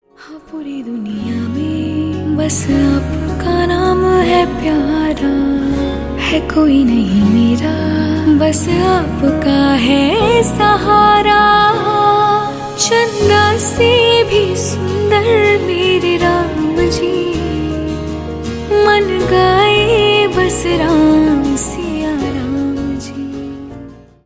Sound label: Ringtone